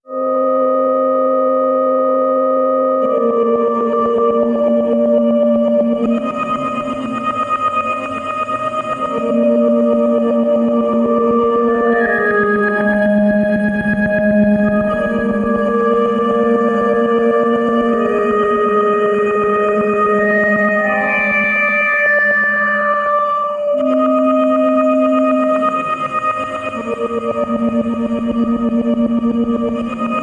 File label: Ambient